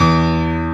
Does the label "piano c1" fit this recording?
Yes